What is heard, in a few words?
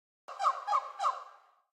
monkey; temple of nudges